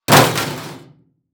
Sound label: Crash Impact Car Crash Small Short; crashes; AdobeCC